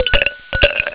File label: ice cubes